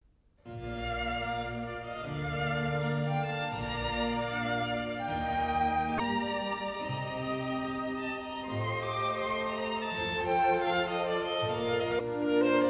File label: Violin Concerto in G minor Largo